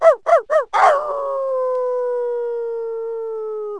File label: bark howl, animals; Wired for Sound Pro CD; Aristosoft, Inc.